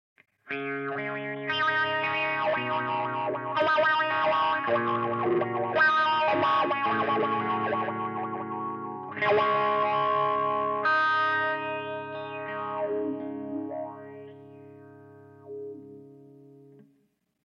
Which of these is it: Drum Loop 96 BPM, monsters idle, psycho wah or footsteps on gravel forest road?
psycho wah